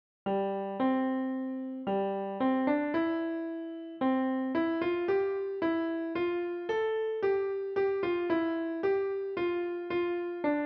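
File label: piano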